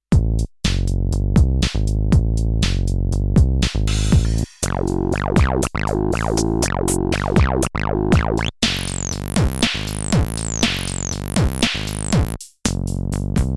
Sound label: drum and bass; plugiator